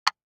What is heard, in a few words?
DICE on Wooden Tray, Drop, Standard, 3 Three Dice; Samples; ArticulatedSounds; DICE Sfx Party (Extended)